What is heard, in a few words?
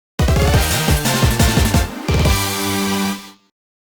Puzzle Passed; YuGiOh Over the Nexus